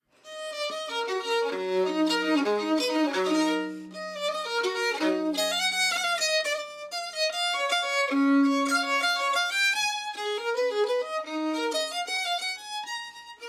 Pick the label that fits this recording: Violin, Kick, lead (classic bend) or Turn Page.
Violin